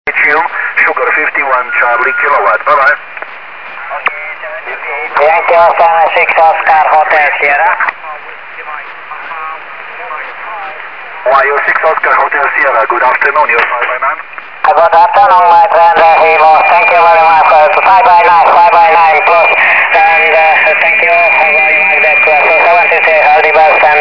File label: helikal